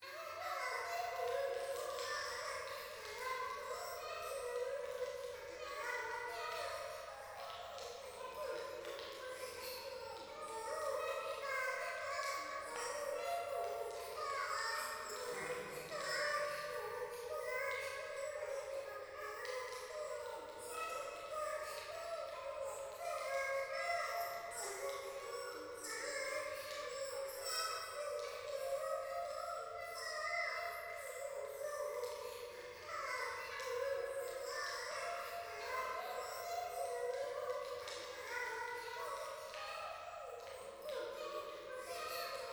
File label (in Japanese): 教会 可愛い喘ぎ 暖炉